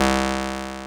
PULS WAV LO, INST WAVES

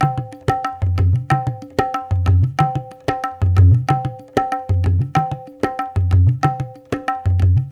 93 MISH, Misha Udu; Spectrasonics - Supreme Beats - World Dance

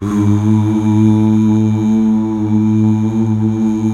UUH

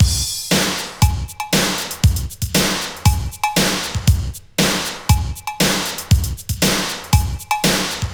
118 4-4, Hyper Dance loops; Best Service

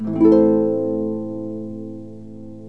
HARP GLISS

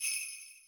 percussion, Millenium MPS-400

